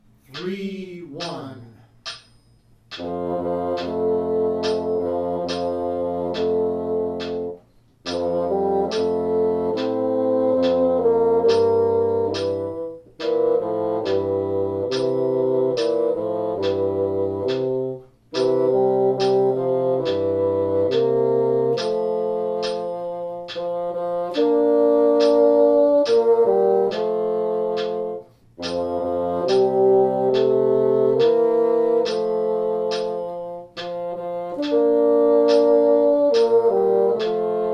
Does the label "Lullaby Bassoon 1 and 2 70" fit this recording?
Yes